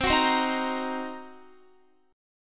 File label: C Minor 7th Flat 5th Guitar, chord